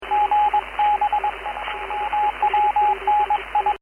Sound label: cw, digital modes